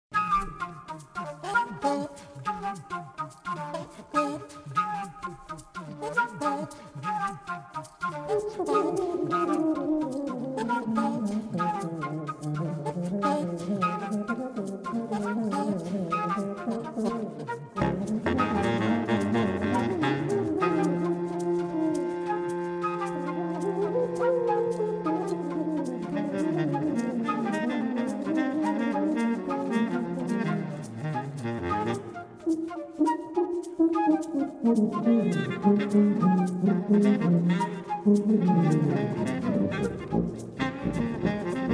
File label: jazz blues